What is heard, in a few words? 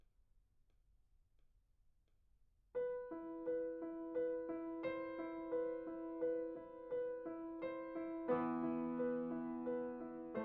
PIANO